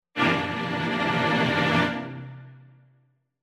TENSION SHORT

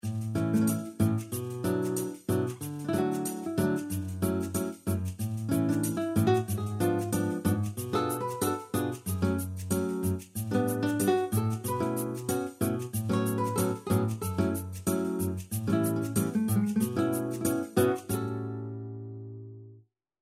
AFRICAN BOSSA

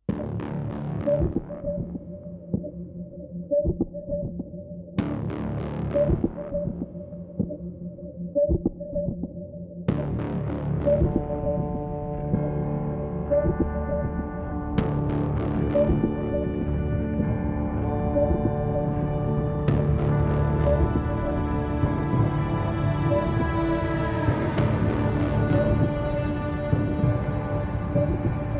DEEP ALERT

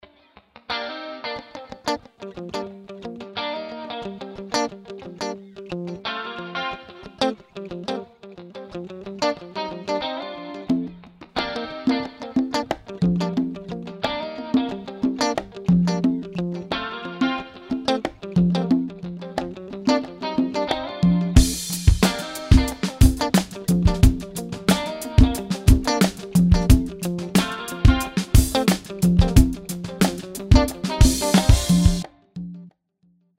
GUITAR

